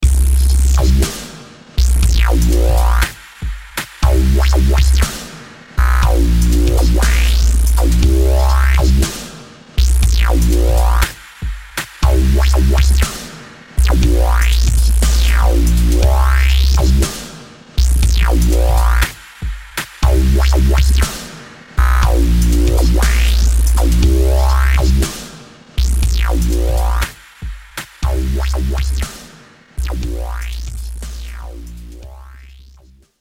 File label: dubstep drum n bass, the drums; ableton